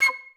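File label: flutes staccato C6; Best Service; Peter Siedlaczek's Advanced Orchestra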